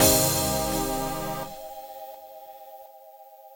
Retry Jingle; Schoolhouse; Friday Night Funkin' Speed.gif OST PC mod gamerip

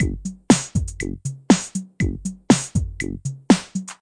Loading Loop

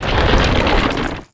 Rock Debris Fall

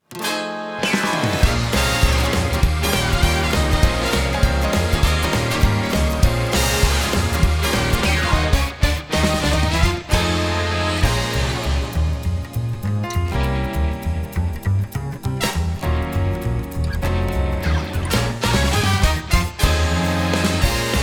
Instrumental; Destiny Child

